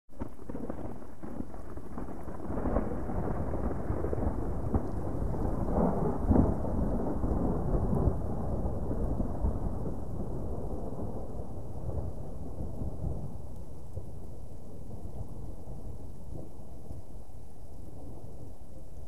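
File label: Thunder; Receding Thunder - Soft Crackle & Rumble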